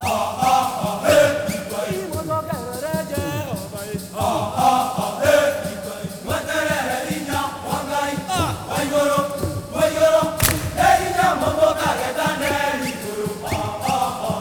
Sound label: Heart of Africa; Spectrasonics; Heart of Africa 2